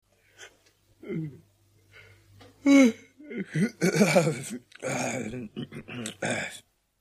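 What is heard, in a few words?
zevota, yawning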